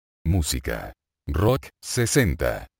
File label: rock